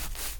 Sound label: grass jump; firefight